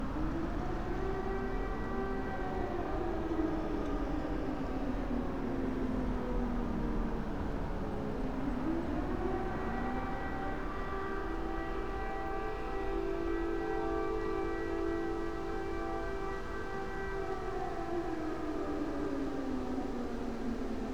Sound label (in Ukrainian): Повітряна тривога